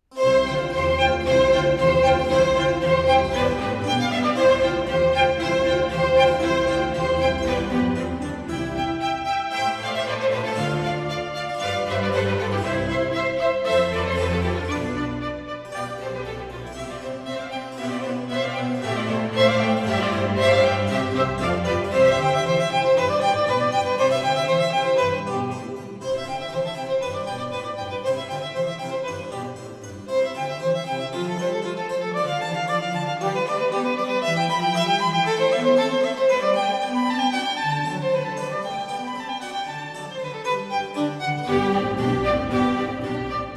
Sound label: Violin Concerto in C Major, Allegro; III. Allegro